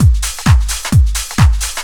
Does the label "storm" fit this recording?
No